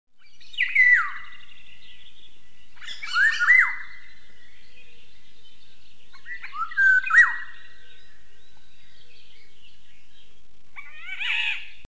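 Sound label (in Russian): obyknovennaya ivolga golos, golden oriole voice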